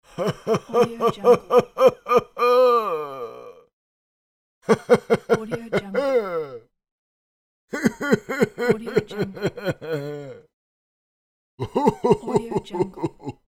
old man laugh; mrsoundfx

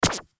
futuristic button

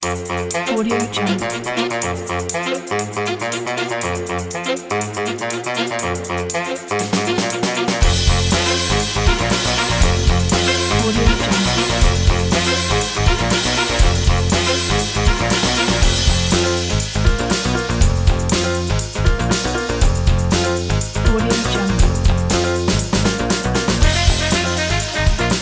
band rock retro

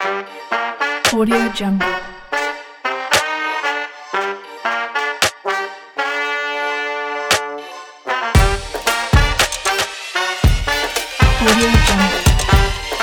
reggaeton brass trap

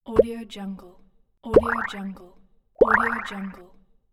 pop notification

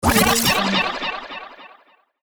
echo blast scratch; sound ideas

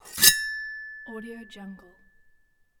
drawing a knife

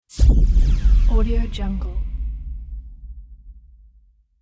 impact trailer; volkovsound

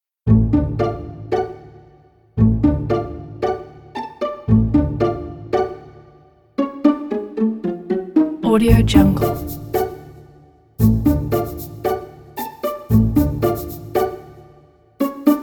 sneaky pizzicato

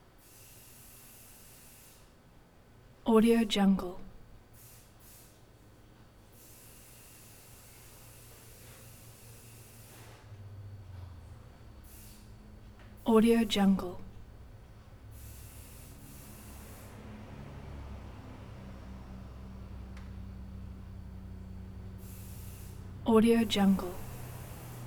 hairspray hair salon interior; prosoundfx

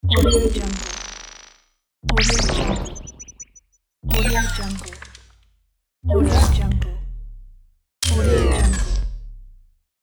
futuristic power down

